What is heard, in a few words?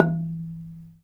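ranat thum E3; Spectrasonics - Heart of Asia CD2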